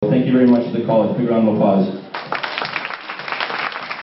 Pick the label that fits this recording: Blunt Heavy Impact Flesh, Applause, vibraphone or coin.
Applause